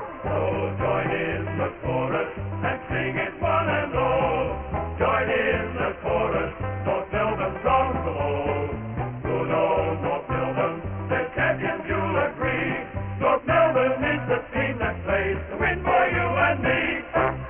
chorus

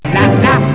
la la

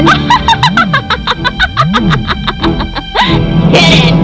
laugh; jessie

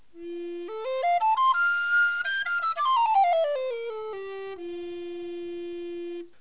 recorder alto range

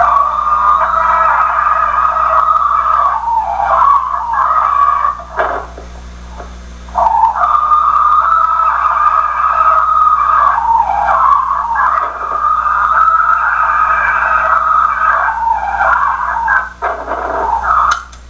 Weird Scream

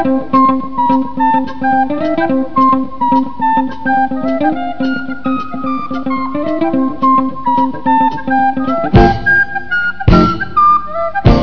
recorder funk

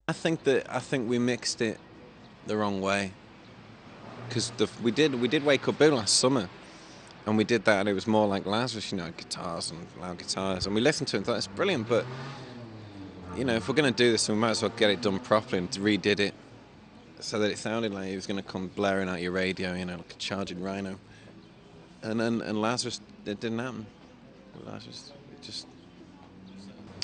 boo

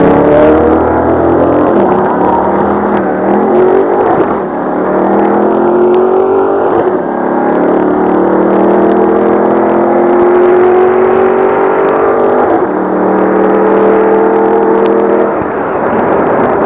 drag

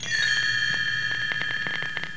tink

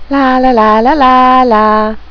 lalala

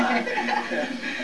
laugh

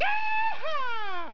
yeeha

